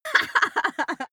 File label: female laugh short